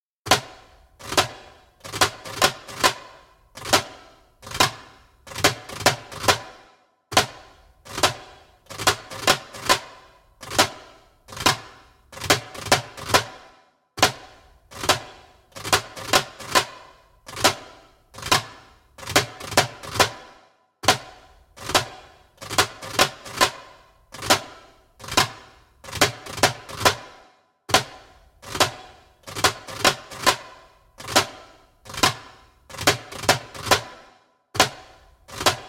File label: Clean Isolations (fast), Djembolale; Djembolale - Fills and Drills